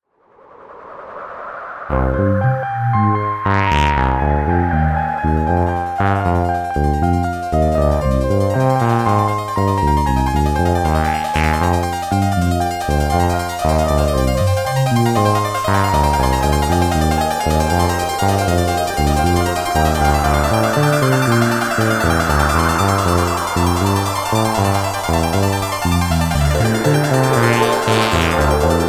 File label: Trippy City ringtone